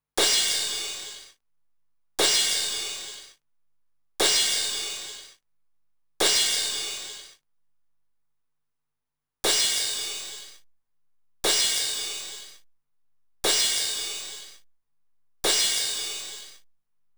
RD-9 vs TR-909 CRASH

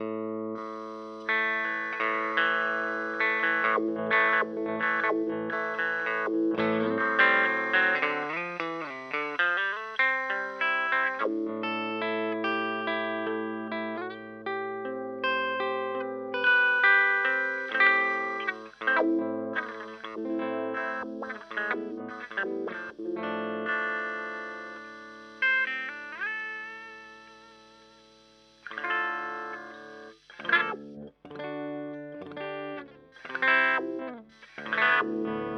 Morley Wah Boost

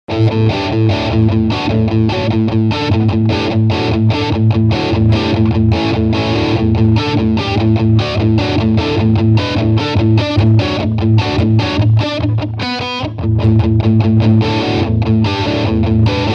Initimidator Rhythm half Ga

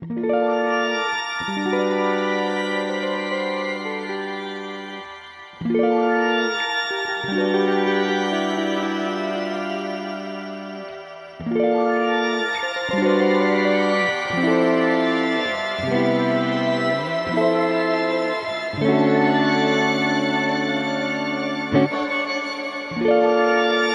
Poly Effects Beebo Crystallize

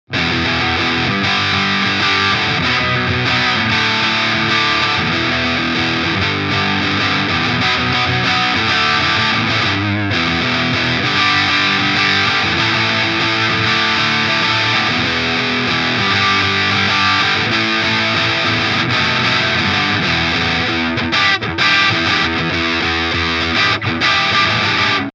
VS Audio Pandora Fuzz EQ flat Boost on

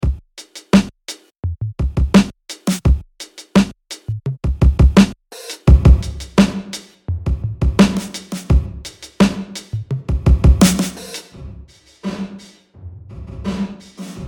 Room

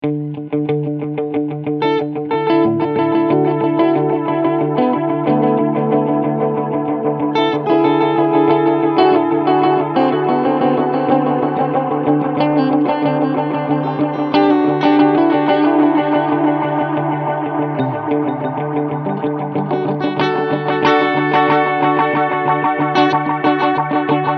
Echorec 3 Head Pattern

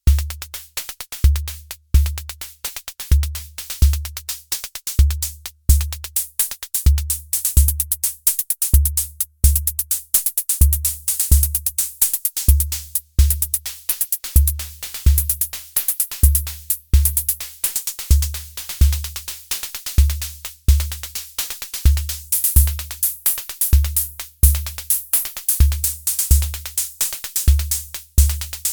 AVP MAD-5 Hihats

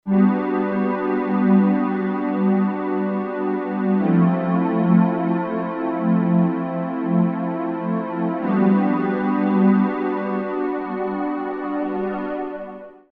hydrasynth neural scan

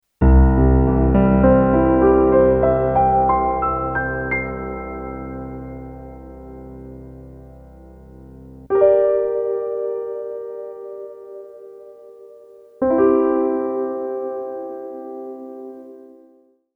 HALL FX Send Tone Decay; Zoom L12; HALL2

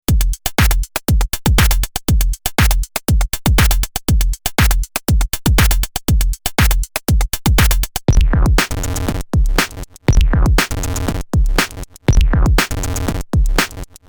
Pocket Operator 12 Beats

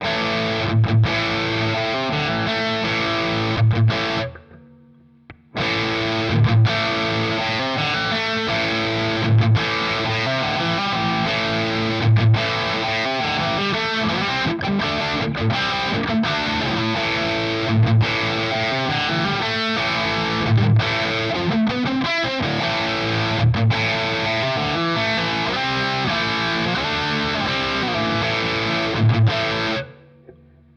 Gain Volume Boost; Sterling By Music Man Richardson Signature

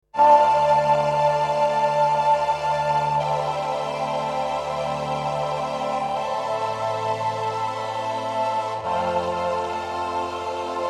VP330 choir strings

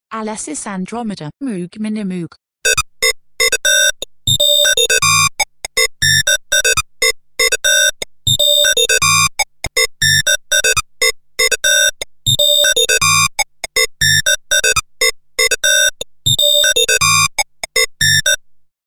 A6 Minimoog Filter Self Reso FM

